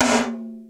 GTOM, drum; Crypton - DrumBase5000; GATE KITS

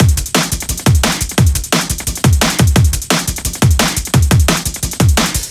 174, Drum Loops; M8; Electronisounds

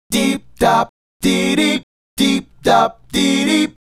Male Group, Hip-Hop and RnB Vocals

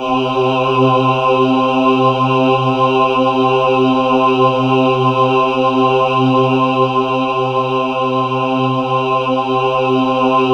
C3, Synth Leads

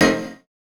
chords

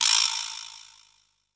Vibraslap, percussion; MARKYDRUMS

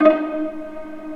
Vla Piz D#3; Digital Sound Factory Virtuoso

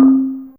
Gam C3, Mallets

